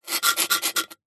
foley rock concrete knife blade scrape scratch; zapsplat

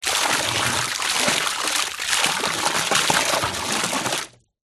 nature water pour into deep water

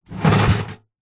metal toolbox with tools inside tilt movement; industrial

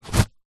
household tissue pull remove from box

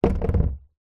foley plastic tub set down on concrete; zapsplat

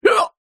human male hiccup

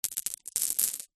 foley gemstones jewels handfull put down

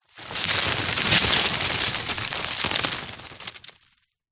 foley dirt collapse fall roll small pile; zapsplat

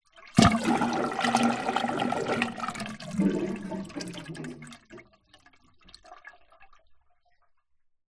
household bathtub drain gurgle

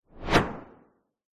whoosh fast

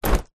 foley shorts combat catch; zapsplat

